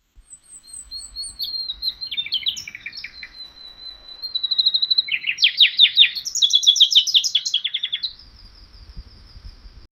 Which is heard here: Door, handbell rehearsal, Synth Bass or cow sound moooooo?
cow sound moooooo